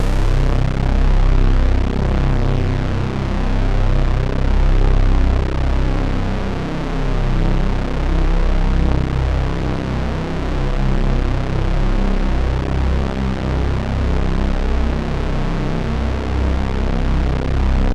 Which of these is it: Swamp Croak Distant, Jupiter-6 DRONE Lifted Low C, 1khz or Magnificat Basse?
Jupiter-6 DRONE Lifted Low C